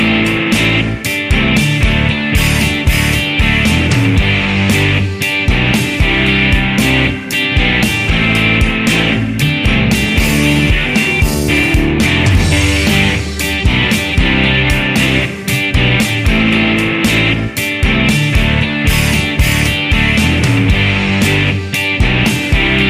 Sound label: pop rock; bbc